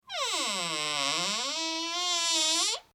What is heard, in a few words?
Horror Door Squeak Long Creak; Creepy Doors (Sound Effects:Horror)